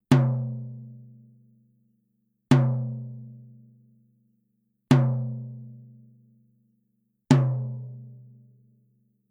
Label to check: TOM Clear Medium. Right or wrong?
Right